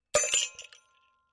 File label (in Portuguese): guizos quebrando